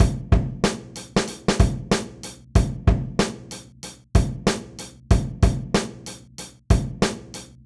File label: GroovePizza 94bpm